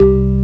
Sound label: organ, M1 organ; Best Service ProSamples vol.10; House; AKAI